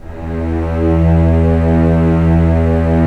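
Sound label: string section; Roland - LA Composer vol. 1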